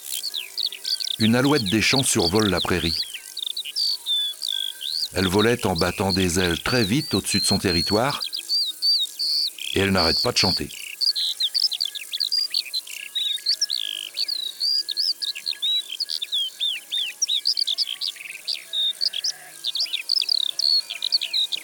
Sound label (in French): Alouette des champs; ABC de la nature